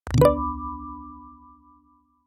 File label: insight